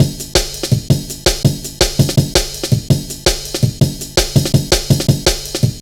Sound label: 165, Drum Loops, Drum & Bass; Total Drum&Bass